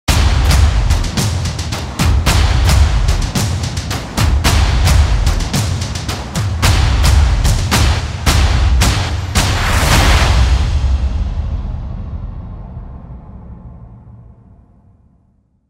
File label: Production Music Trailer Tools Buildup; CATastrophic